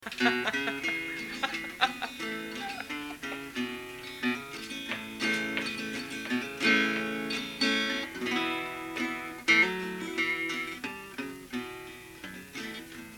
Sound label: Country Music